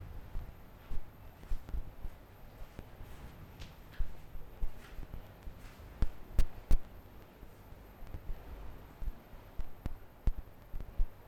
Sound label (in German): Rode NT 4 (fehlerhaft), Gewitter, Curacao; Fieldrecordings